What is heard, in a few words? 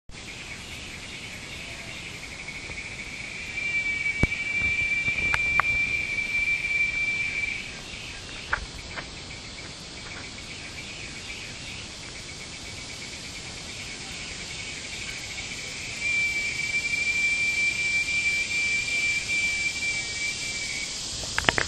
Brazos county cicada